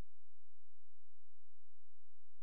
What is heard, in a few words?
A#, Synclav FM; Big Fish Audio; SPACE1